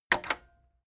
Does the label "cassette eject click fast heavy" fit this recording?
Yes